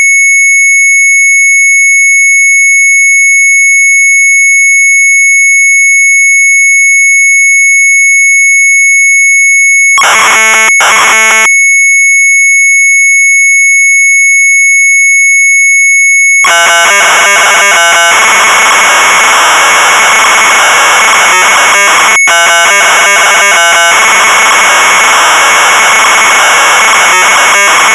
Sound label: MZ800 Tape, sharp; BEST.-UITVOEREN; retromelsarchive